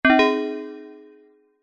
multimedia alert notification basic bell synth positive; zapsplat